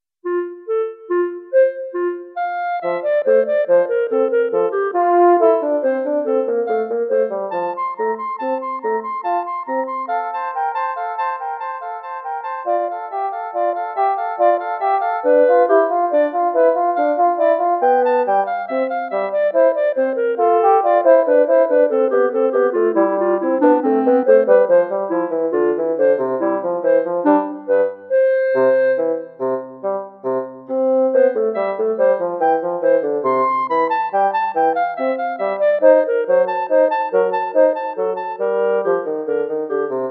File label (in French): inventions a 2 voix bois; bach johann christian; 15 inventions